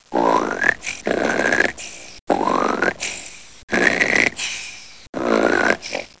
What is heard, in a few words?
snoring